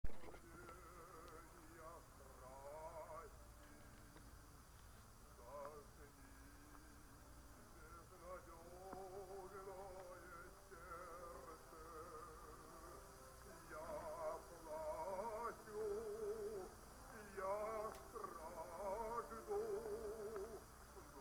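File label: Uguisu, Japanese bush warbler; 『コンペティション』1980年; レコードからディミトリーの歌で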